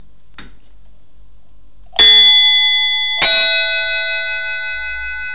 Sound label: bells